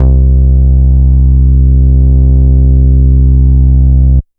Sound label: Bass Long C; VEC1; Club; Long Basses